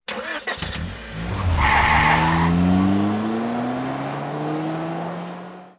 car; Mafie 1

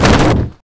bodyfall; Mafie 1

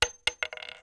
wood; Mafie 1

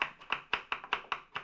kitch; Mafie 1

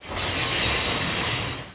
vagon; Mafie 1

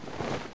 noise; Mafie 1

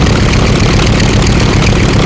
air; Mafie 1

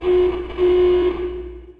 horn; Mafie 1

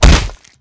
body; Mafie 1

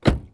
close; Mafie 1

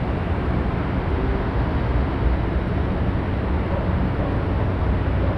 ambi; Mafie 1

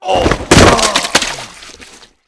bodyfall; Mafie 1

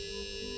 electric; Mafie 1

